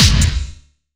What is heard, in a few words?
Snare, Gated Reverb; musicradar